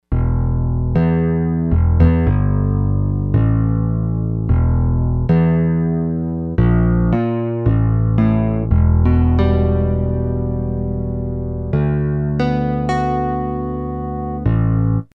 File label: Bass